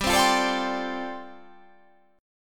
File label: G 9th Suspended 4th